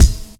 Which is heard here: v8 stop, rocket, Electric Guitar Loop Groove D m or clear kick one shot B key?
clear kick one shot B key